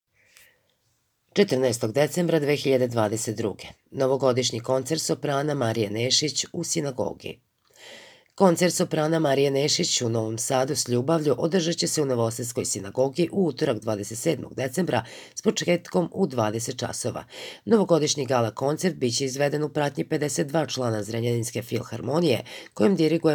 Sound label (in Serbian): koncert soprana